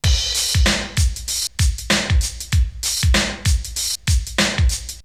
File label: Drumloop; ZERO G - Datafile DISC 1